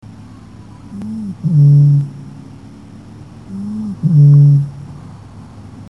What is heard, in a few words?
Botaurus stellaris, Tarabuso